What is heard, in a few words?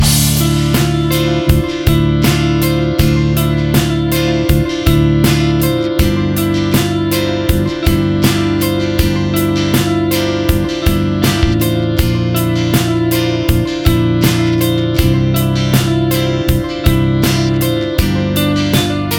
octaver; gitaros